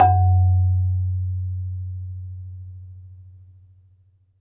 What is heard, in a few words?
MARIMBA B; ILIO